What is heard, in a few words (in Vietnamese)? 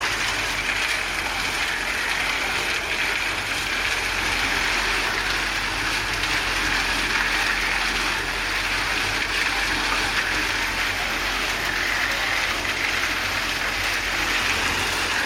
tieng may tron ho tron be tong mini hoat dong